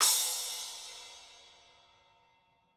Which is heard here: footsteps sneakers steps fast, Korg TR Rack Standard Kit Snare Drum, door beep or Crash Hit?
Crash Hit